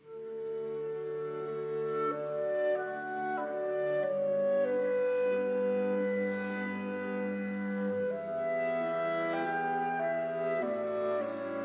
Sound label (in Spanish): clarinete